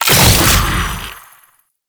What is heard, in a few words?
Fuel Projectile Super Pick Up; Warframe; Jade Shadows; Ascension